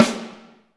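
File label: snare drum; Best Service Gigapack 2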